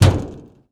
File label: metal auto, player footsteps